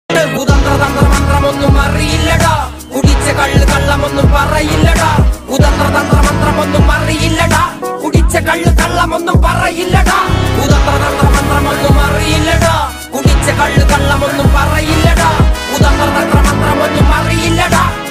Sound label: rap music; manjummel boys